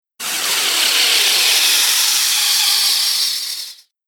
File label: Spinning Laser Flyby; Laser Sound Sound Effects; Whoosh Sound Effect